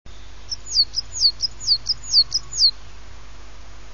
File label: parus ater